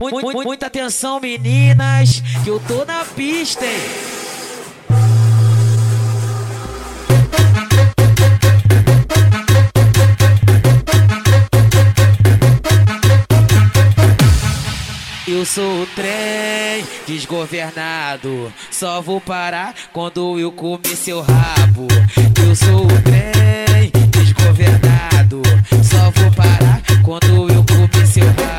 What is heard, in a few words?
funk bass